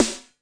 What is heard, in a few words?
prophet Snare Reverb; Hip Hop; STYLE KITS (Hip Hop, Etc)